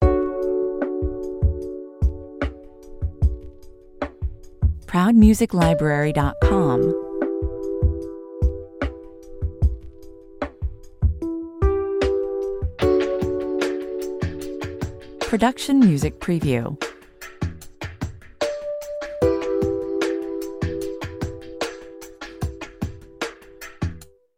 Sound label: fretless ambiance 150 bpm, very sparingly arranged, fretless bass with soft key; fretless bass with soft keyboards and percussion fills; In a general sense of the Zeitgeist very sparingly arranged